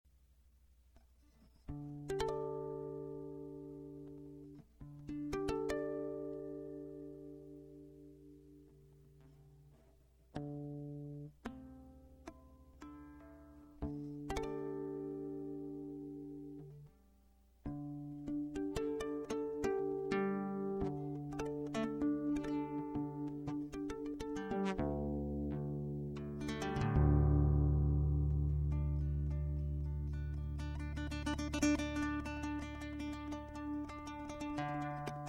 instrumental; Cristovão Colombo e o Ovo de Avestruz

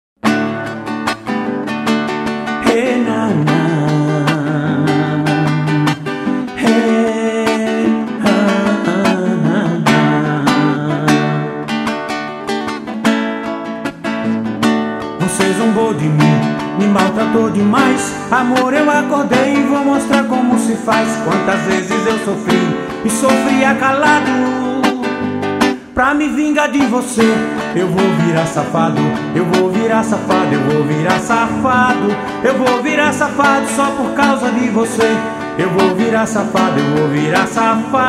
voz e violao

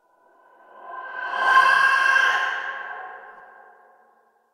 efeito sonoro fantasma passando gritando

